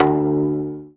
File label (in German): ZIMBAL D 1; Best Service; Ethnic Journey Sound Cube